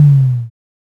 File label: impact hit